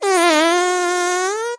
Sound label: fart